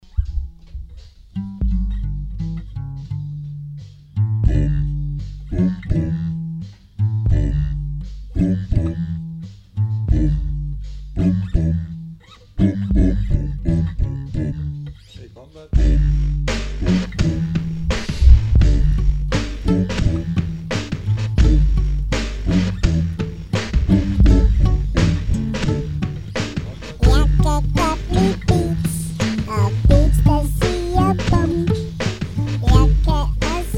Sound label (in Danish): Beats der siger ting